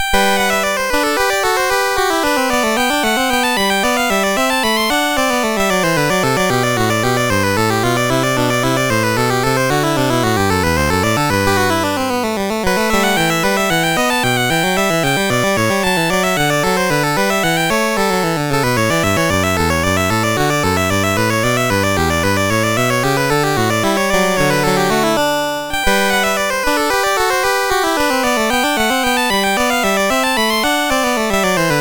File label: Courante, MOS6581R4; Joseph A Grau; Fifth French Suite